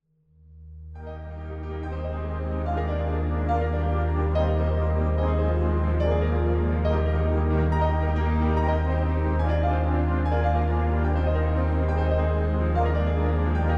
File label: Prelude in E flat major